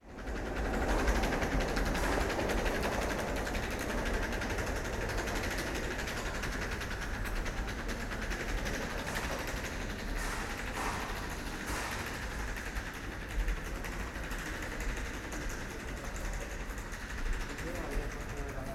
Suitcase

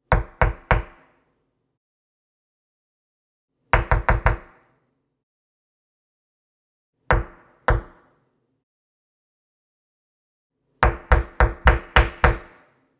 Judge Hammer Courtroom

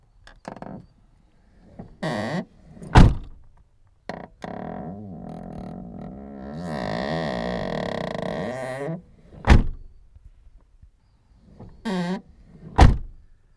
Car door closing inside

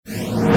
Reverb Upphase

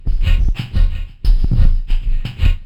Odd Drum Loop 90bpm

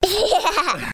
Toddler Laughing

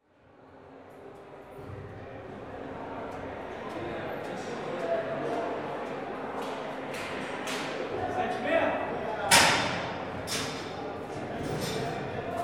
Walla Of Brazilian Jail

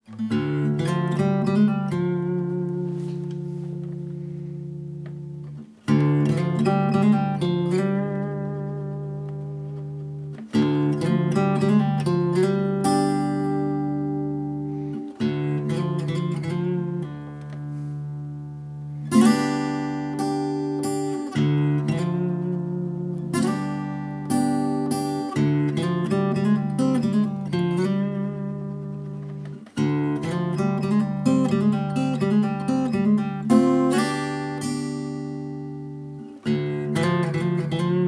Blues Guitar Intro

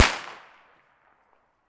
Firecracker Explosion

